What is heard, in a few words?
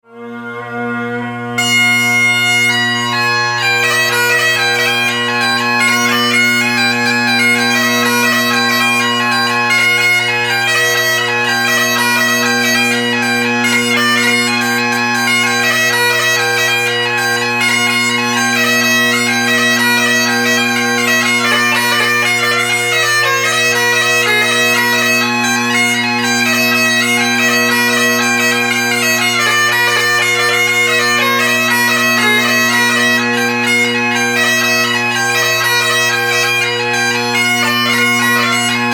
Jig, Bagpipes